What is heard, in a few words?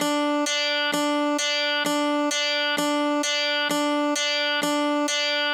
kawai k1 OMNIBUS LOOP; wavetables